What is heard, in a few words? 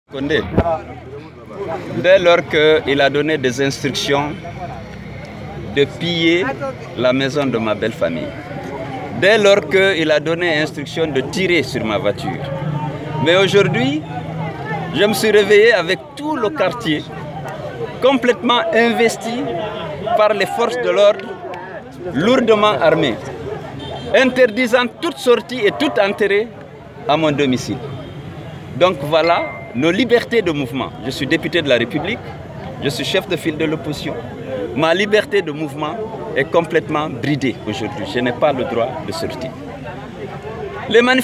Vocal